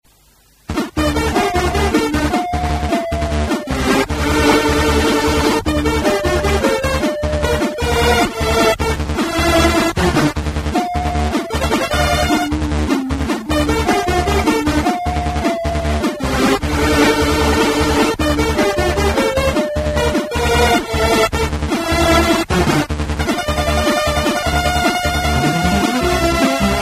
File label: c64